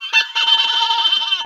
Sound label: Wizard Mini Laugh